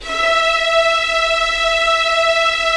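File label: Strings Violins; Roland - LA Composer vol. 4; 90 s Sample CDs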